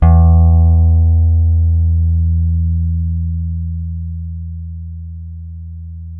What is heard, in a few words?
E 4 JAZZ GTR; Univers Sons; Jazzistic